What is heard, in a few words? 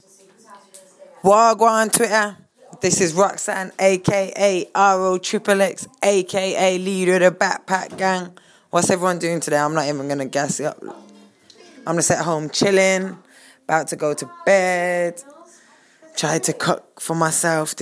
shout ooowt